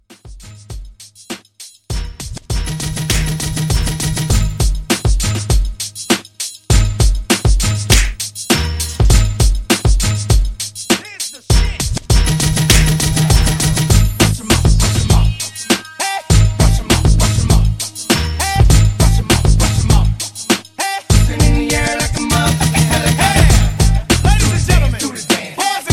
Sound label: Mashup 100